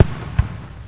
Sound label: launch, fireworks